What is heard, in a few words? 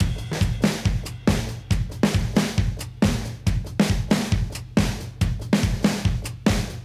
Modern Breaks; Touch Loops; ClassicBreakSessions